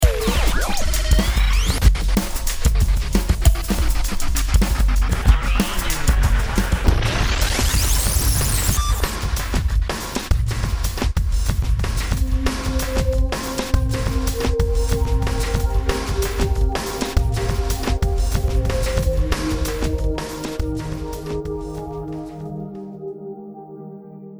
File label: Graffiti PSA Techno